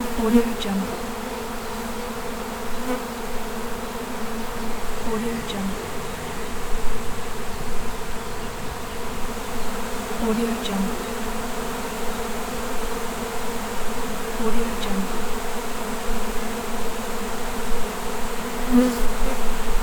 bee hive

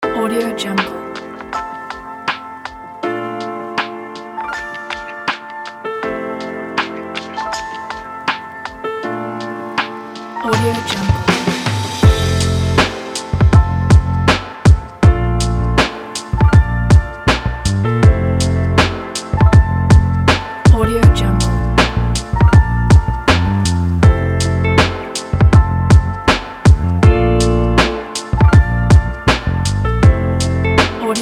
lofi chill hop

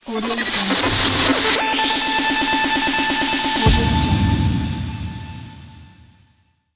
radio logo

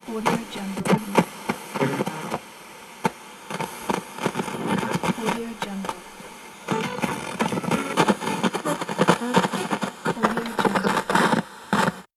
radio tuning

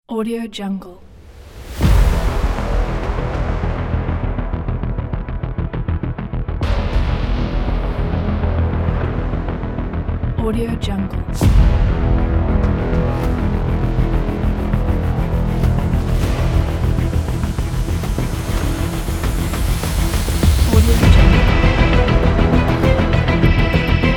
action trailer

